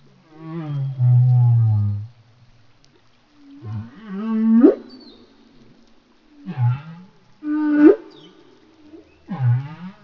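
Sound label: humpback whale song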